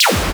noise fx; Masterbits; Switch FX

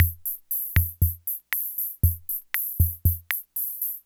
Loop, classic machines, rhythm77

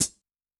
Pedal, HiHat; Yamaha DTXpress-II

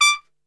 D 4 X PIC SHT, Brass; Best Service; Brass Super Section

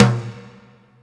TOM, M1-M3R; Greytsounds VOL-1

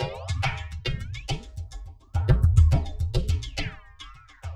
Quantum, Processed Percussion

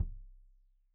Kik Stereo; Drum; Deeply Sampled Drums; SMDrum

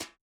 Brush Slap; Yamaha DJX-2B

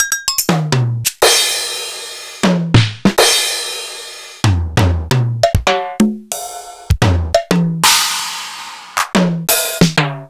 Roland Tr-6260; PARTYNITES89

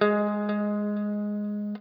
NOODLE LONG, Guitar & Bass

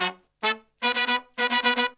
Pop & Funk Brass, L; Best Service ProSamples vol.25; AKAI

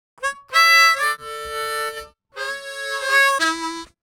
Harm 098bpm A, Harmonica

